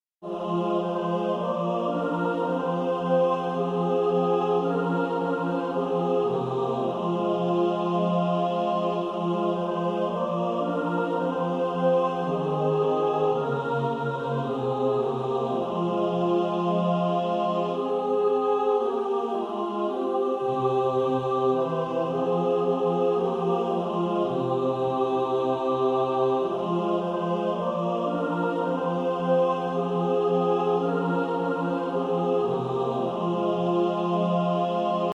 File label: mixed